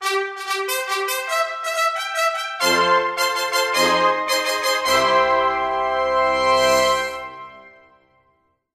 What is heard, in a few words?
fanfare, jingle